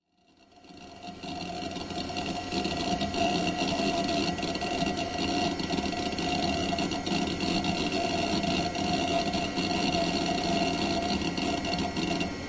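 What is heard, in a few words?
Computer Pc Heavy Reading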